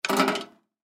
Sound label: Film Light Arri Halogen Pick Up Stand; Foley; Miscellaneous Electronic